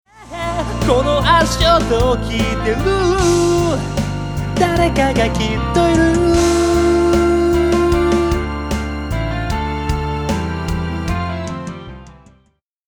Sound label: half beats